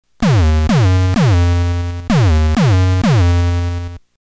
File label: MelodyKick, lsdj; MelodyKicks